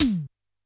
C3 - E Tom, Yamaha PSR-E323 Electronic Kit

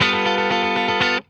G JANGLE, Funk Guitar; Zero G - Funk Guitar

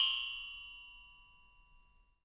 PRC COIL, Perc Toys; Spectrasonics; Supreme Beats; World Dance

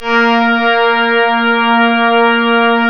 ORGAN, A#; Big Fish Audio; Synclav FM

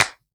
CLAP, Dance Drums; Best Service ProSamples vol.15; AKAI

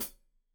Hat Foot, Hi-Hat, Cymbals Stereo; Deeply Sampled Drums

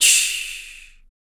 CYMBAL, MALE PERC; Spectrasonics Vocal Planet; Jazz FX

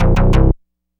Purple Bass; Dr. Fink's Funk Factory

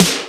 Roland R-8 Snare, Drums

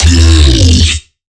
bass drop

